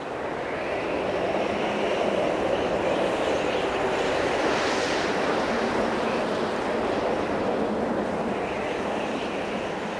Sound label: Sky wind; Seer; GamigoNA